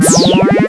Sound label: reload